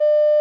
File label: bleep